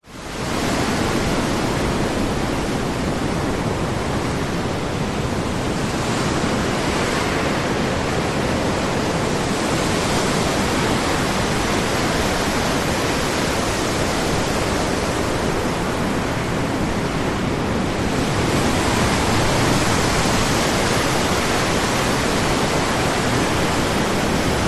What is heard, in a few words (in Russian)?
shum voln